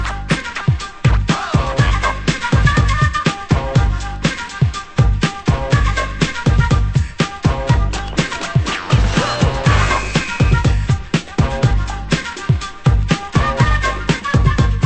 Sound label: funk